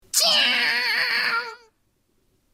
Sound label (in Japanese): 時代劇・カ～～！